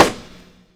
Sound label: rim